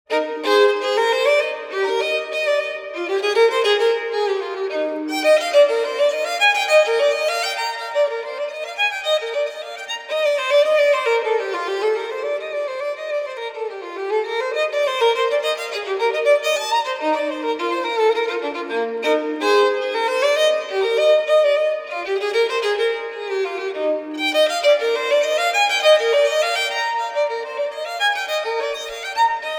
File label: Partita no. 3 in E major Bourree; Bach; BWV 1006; VII. Bourree